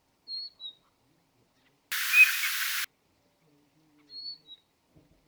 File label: Siberian Rubythroat - Call; Calliope calliope; vertailu oikeaan rubiinisatakieleen